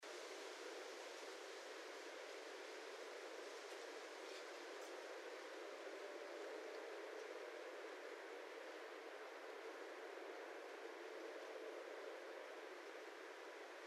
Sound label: Raccoon; Northern Raccoon (Procyon lotor)